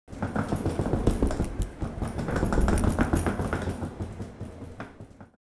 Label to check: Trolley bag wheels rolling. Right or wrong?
Right